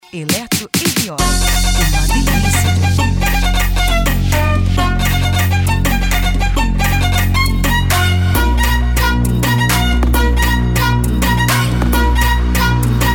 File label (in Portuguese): eletro e viola especial de eletro funk